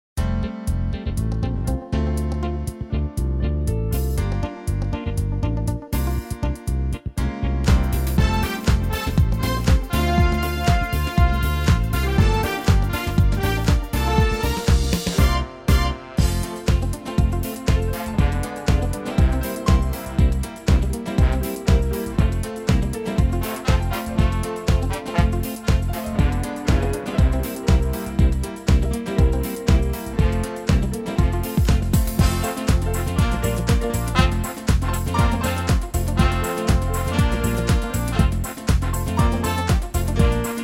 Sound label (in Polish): Hymn szkoly wersja instrumentalna